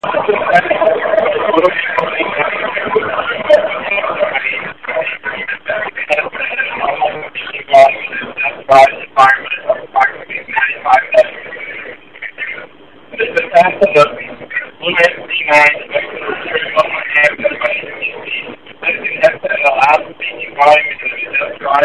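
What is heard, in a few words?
Indust 95db, headset; BlueAntZ9 max; Mogul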